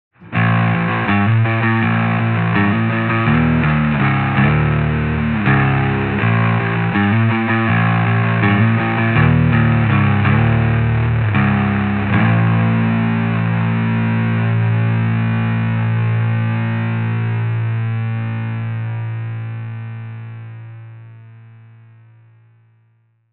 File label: Crush Half Dirt O Bass